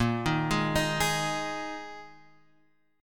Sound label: A sharp 7th Suspended 4th A sharp, Guitar, Drop D